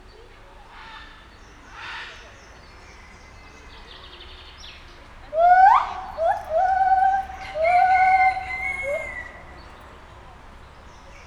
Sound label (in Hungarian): háromszor megszólal, fehérkezű gibbon; nyiregyhazi zoo 2019